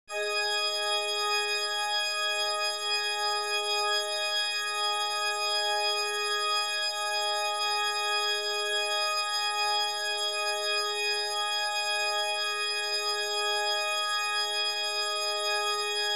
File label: G Drone